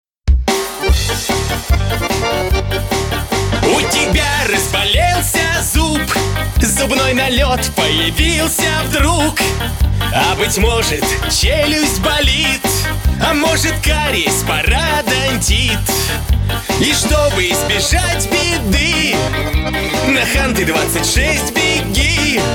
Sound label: vocal